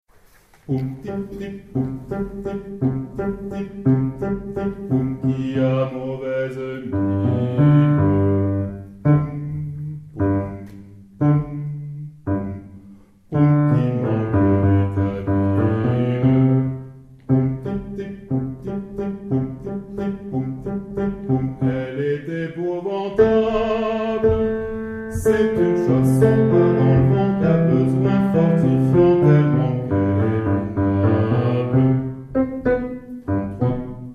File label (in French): CHANSON SANS CALCIUM Hommes